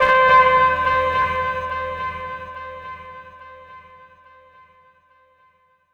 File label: Trumpet Echo